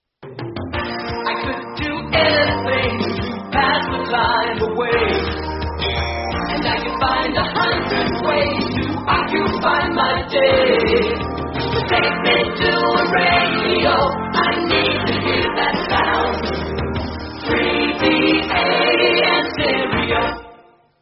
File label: jingle; australian radio 3ba